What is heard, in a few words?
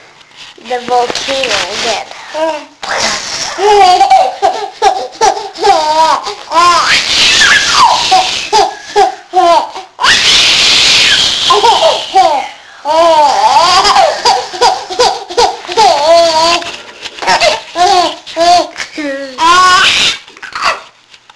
laughing; wild giggling